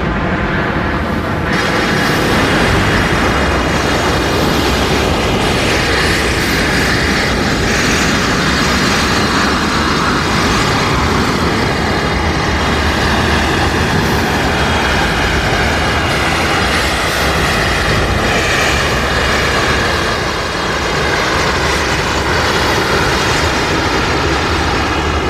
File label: wind edge flange blast; Titanfall 2